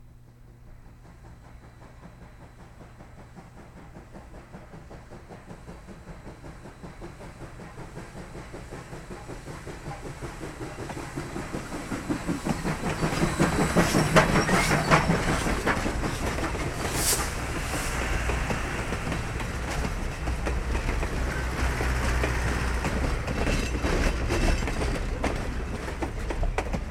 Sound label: Passby No Horn